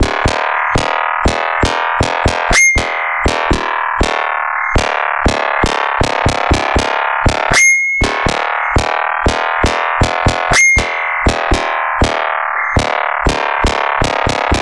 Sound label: Structured Rhythm, IDM Rhythms